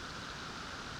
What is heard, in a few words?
Background Noise